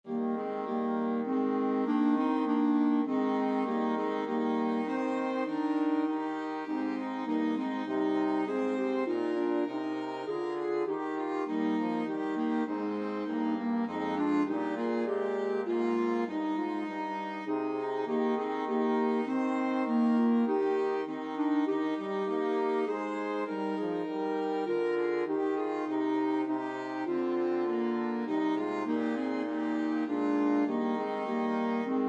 flexible ensemble, 4 players

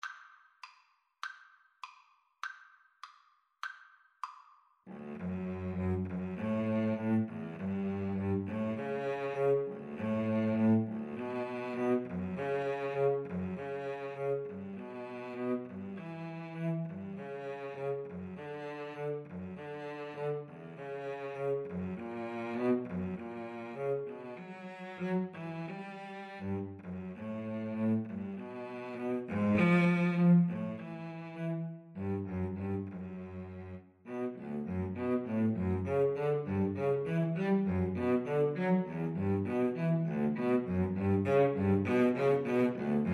cello duet

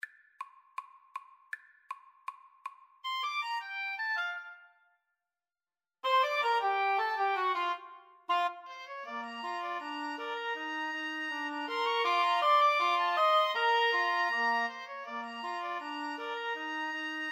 Woodwind Trio; 2 OB CA

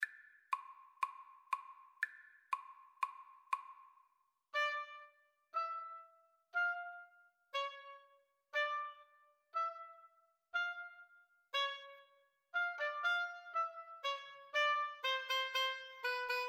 Clarinet Oboe Duet

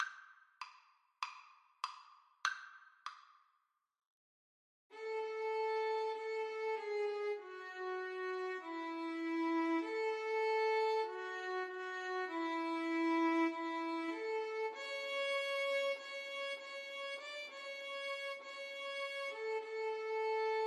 violin duet

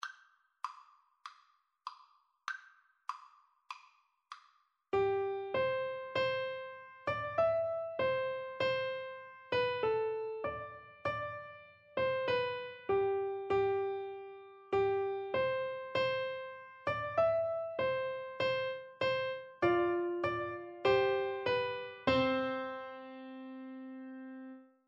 piano duet

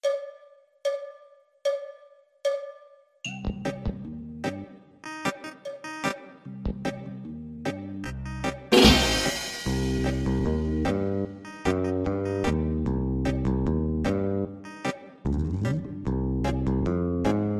reggae, Saxophone

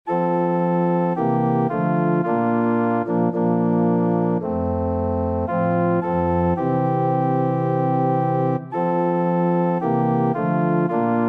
organ

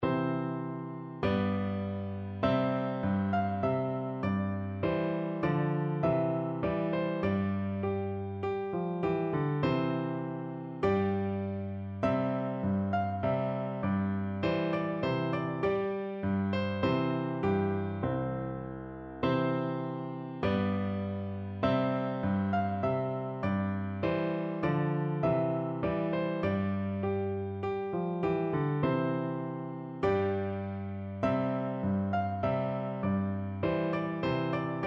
trumpet voluntary piano

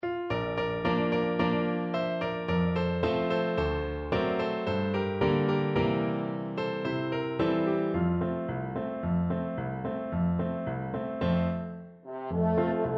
french horn

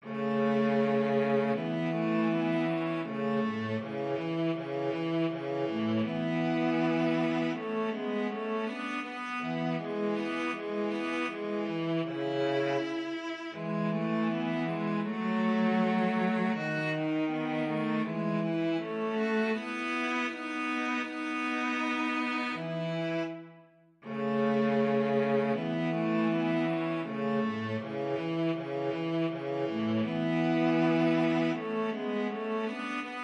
viola cello duet

